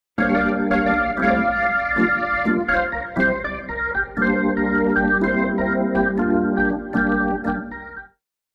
swell amplifier organ wet

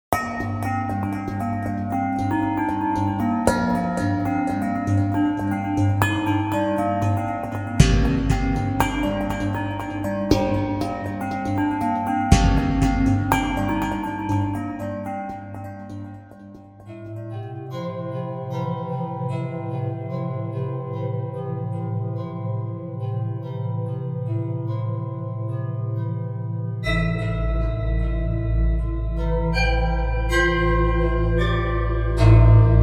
big bowls

